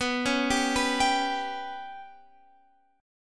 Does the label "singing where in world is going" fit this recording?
No